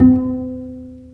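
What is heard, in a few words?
String, contrabass pizzicato; Roland - String Master Series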